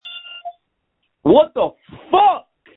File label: Scream